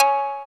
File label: FX DRM, Analog; Sound & Vision - Gigapack II